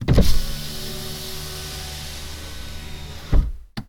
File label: Car Window Down